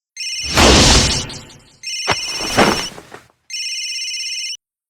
Breakdown

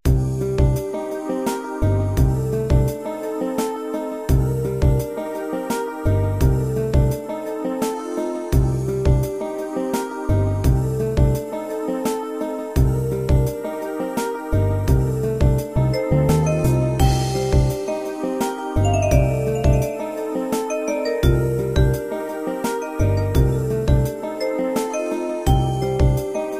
Rainy Night

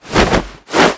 stab; William

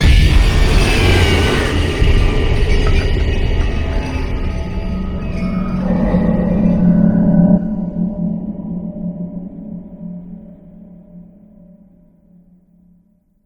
screech

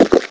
chug; t&t; godot

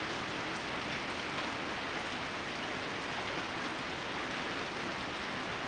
rain outside

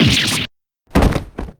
faint; soj-rayfa

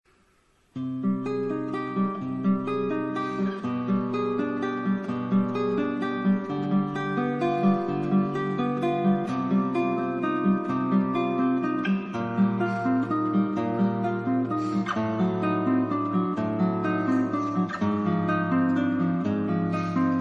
Prelude in C Minor, Relaxing and Calm

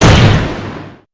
heavy slam

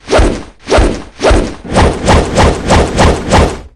camera swing; Mamemomi

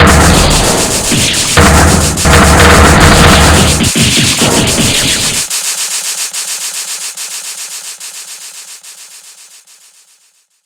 breakdown; belle

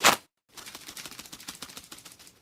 patches

